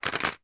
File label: toy guess who flaps up all together